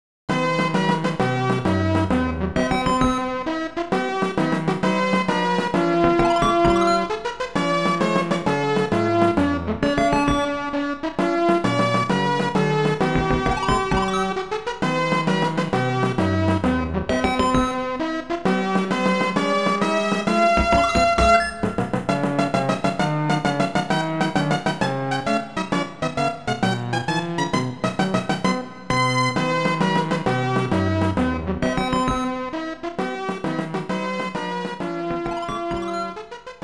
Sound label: American Victory; Koei Original BGM Collection Vol.6; Teitoku no Ketsudan; Super Daikoukai Jidai